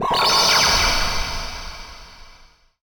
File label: HALLWUPP, HORROR; AKAI Anime Sound FX